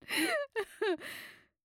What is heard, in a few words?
laughter, female, 30s, inhales, titter, light squeal; Eiravaein Works